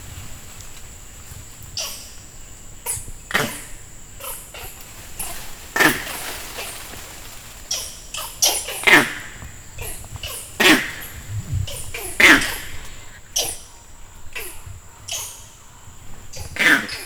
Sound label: Leopard presence, campbell; vocalisations; compcog